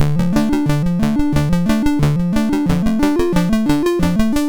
sid MOS6581R2, GAMES; Fantasya

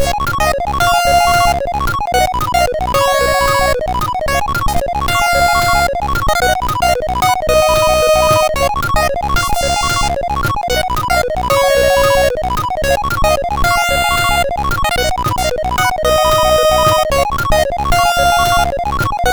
Skazastan, sid CSG8580R5

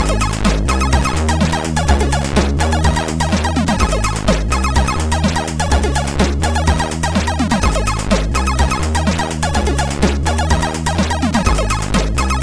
Beach plus Bass, MOS6581; sid

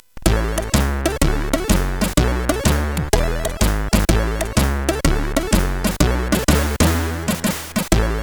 JAZZ MAD, CSG8580; hvsc

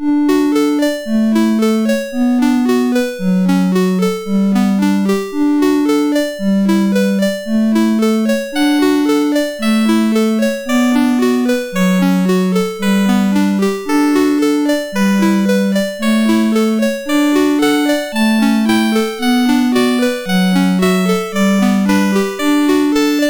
Synth Sample; MOS6581R4

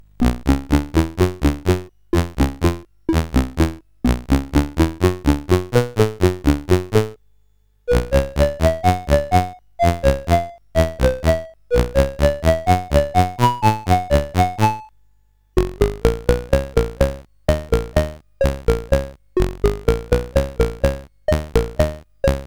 CSG8580R5, GAMES; WitchSwitch

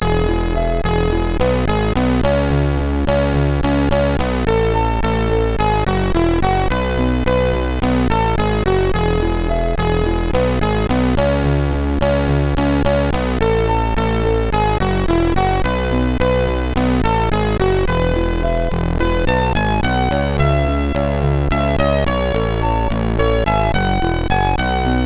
Next Music, sid MOS6581R3

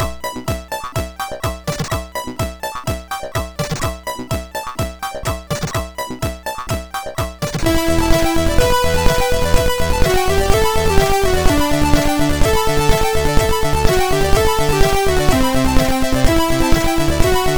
intro sid MOS6581R3; RoMuzak V6 3

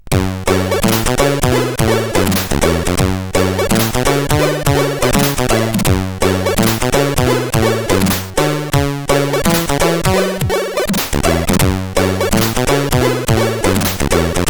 Jazzy Mood, CSG8580R5

